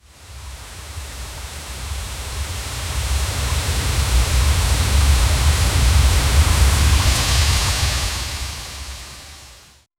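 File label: running, footsteps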